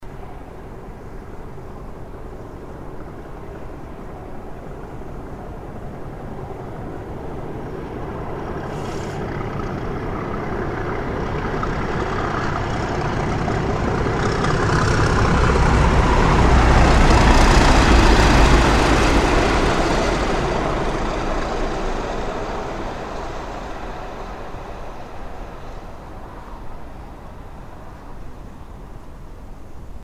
NormTrains

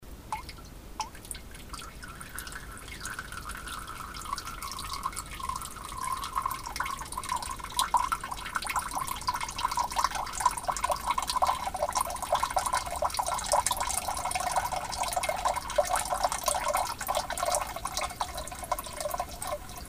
Flow Starts, Plumbing

